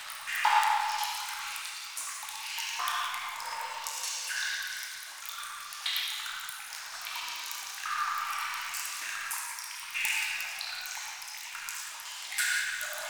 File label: Lair Long Hall Drip Loop; Warframe; Ambience; Sentient